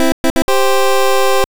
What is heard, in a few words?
pick